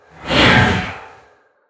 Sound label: Attack; Amgam Rager